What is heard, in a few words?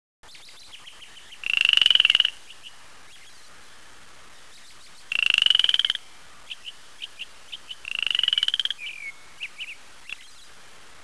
Frogbird